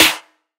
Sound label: snare